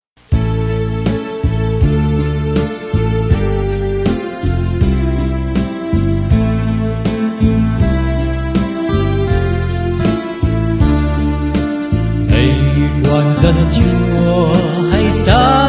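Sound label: soprano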